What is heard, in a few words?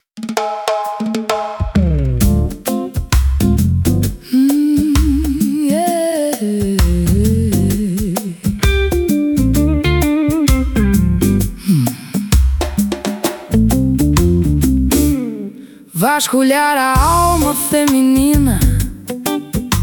Samba Blues